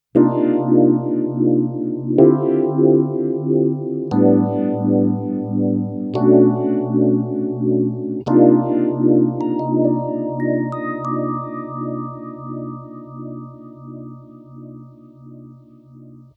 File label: phaser